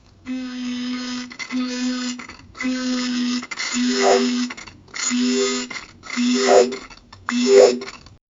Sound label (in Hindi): koi massage ane wala soun